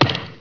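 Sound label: bolt stick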